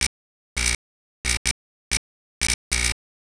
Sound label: light broke; kingpin